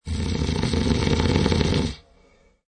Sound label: Snore